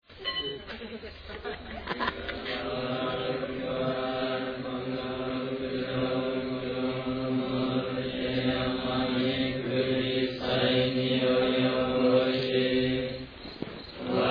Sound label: end chant